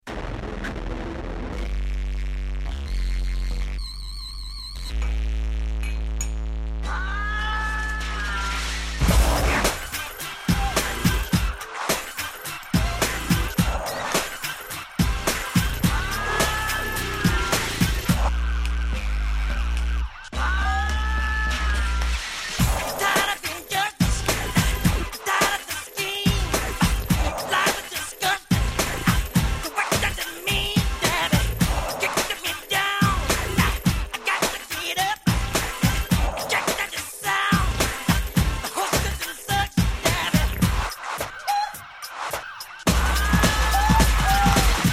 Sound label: scream